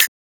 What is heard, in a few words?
Closed Hat